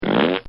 Cartoon Sound Effects Flaps; Cartoon Sound Design

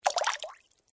Water Drips Multiple, recorded in a domestic pool

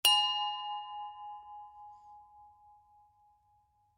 China Bell Ring 2; Miscellaneous Impact; Tom Hutchings

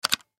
Button Switch; Multimedia Web Interactive

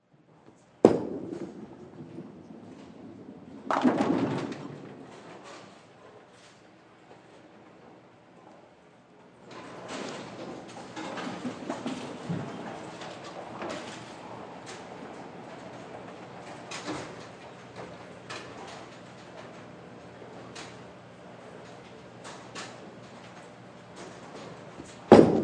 Sports Bowling Alley Ambience Less Active; Bowling (Sound Effects:Sports)

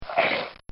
Cartoon Sound Effects Crunch; Cartoon Sound Design

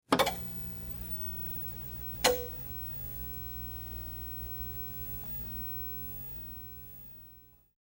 Tv Set On Static Warm Up; Technology; Television Sound Effects; Televisions (Sound Effects:Electronics)

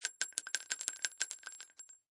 Bullet Shell Drop Machine Gun Multiple Cement; Machine gun multiple empty shells fall on cement; Lynne Music

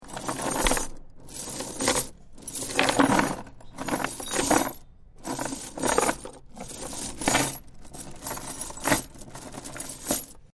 Heavy Chain Drop Multiple; Chain sounds; Metal Sound Effects

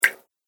Water Button, button sound effects; multimedia

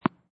Tennis Ball Bouncing on Court Medium

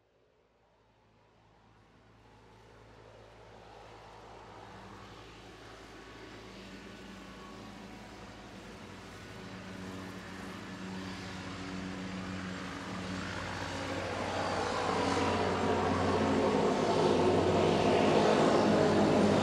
Plane Dash 8, Plane Dash 8 Airplane Land; Transportation Aircraft; Airplanes

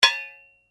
Blade Heavy Impact, Sword

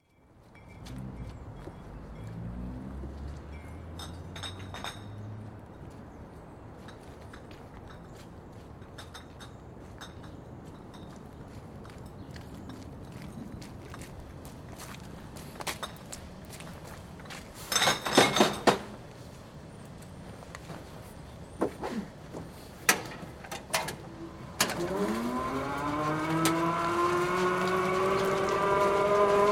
House to house milk delivery, late morning; City; BBC

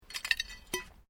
Glass move quiet

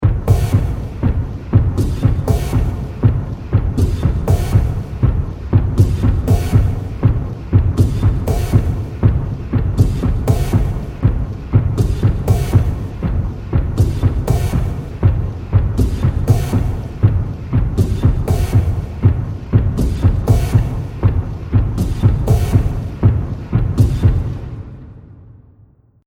Future War Drums Loop; Sci Fi Music

